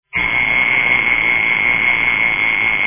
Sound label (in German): venus, armbandwecker